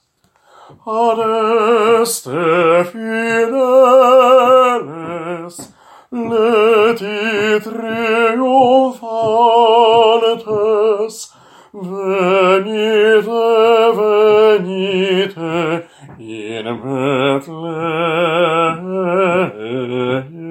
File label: Adeste fideles BAS